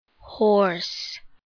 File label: horse